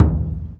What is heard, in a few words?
Northline Transit Center Construction Dumpster, percussion; Houston